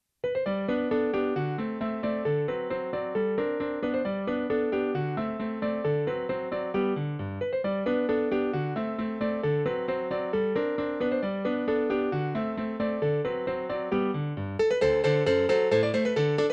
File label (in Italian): Scozzese In Sol Maggiore Per Pianoforte; Ludwig van Beethoven